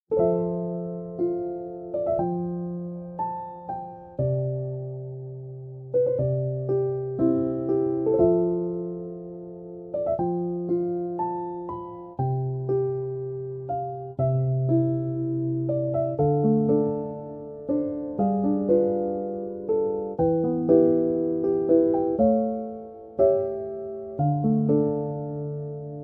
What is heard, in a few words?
A Beautiful Melody